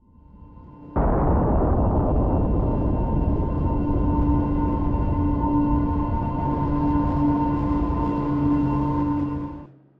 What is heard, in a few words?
music cinematic; drowning3